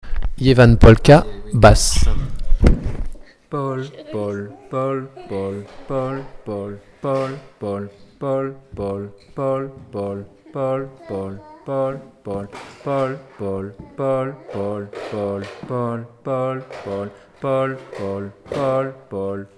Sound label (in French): basse; Ievan polka